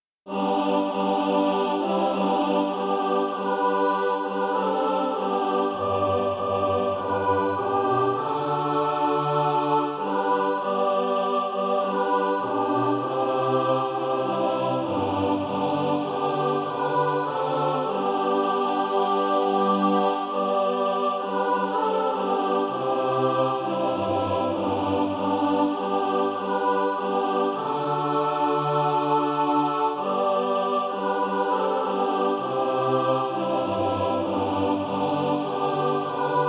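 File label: mixed, SATB Practise Music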